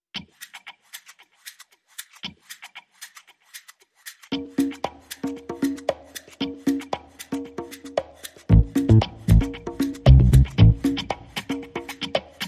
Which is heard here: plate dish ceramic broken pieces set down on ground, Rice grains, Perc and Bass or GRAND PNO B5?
Perc and Bass